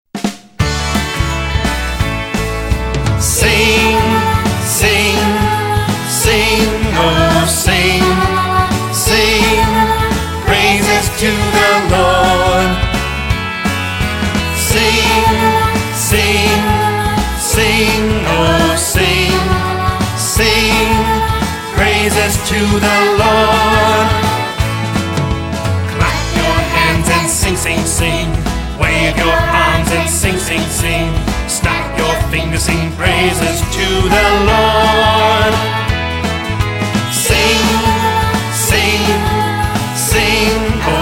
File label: Sing Praises Song Gospel; Gospel Light